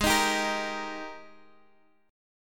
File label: G sharp Major 7th Suspended 2nd G sharp, down, Guitar, Standard; strummed; chord